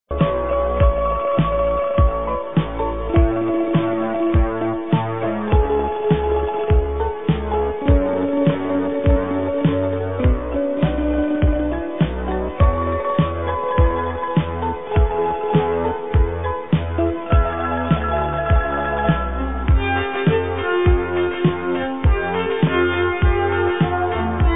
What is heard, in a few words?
Kalimba; POND